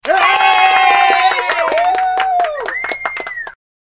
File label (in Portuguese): aplausos